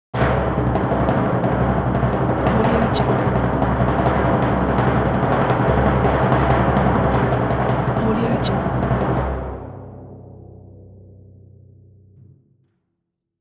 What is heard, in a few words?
timpani roll long